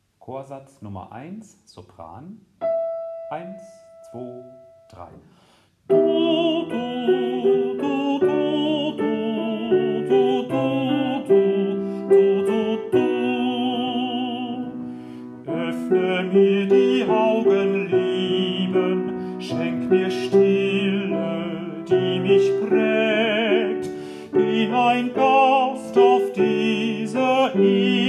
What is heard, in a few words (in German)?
Sopran; Dioezesan Caecilien Verband; DCV Chorfahrt; Übaufnahmen